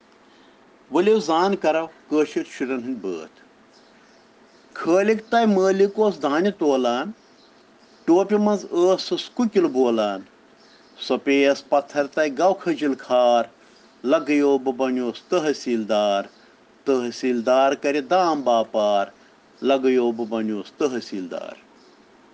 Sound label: Children Rhyms in Kashmiri